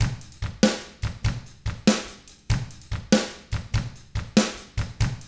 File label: rock 6/8, drums; ILIO; Double Platinum Drums 1